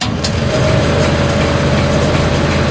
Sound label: horizontal start, crane; hl2; doors